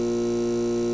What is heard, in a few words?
light hum; Star Wars Knights Of The Old Republic II; XBOX